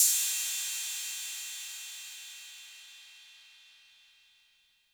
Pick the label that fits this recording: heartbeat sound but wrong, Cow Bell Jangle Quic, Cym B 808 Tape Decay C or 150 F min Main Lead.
Cym B 808 Tape Decay C